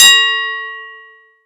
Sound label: bell ring; Birthday Party Bash; Wii; rock climb